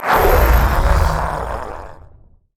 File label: pain; LoL; trundle